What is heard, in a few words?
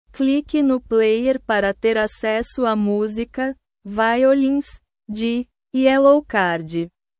violins